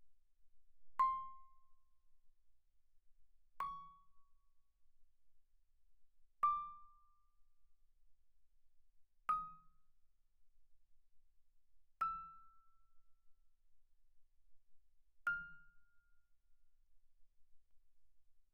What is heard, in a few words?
Vibraphone dampen mf C6 F6; Percussion